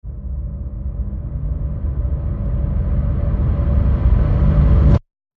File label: Low Reverse